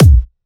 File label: 128BPM Kick; Vengeance Samples; Venus Kit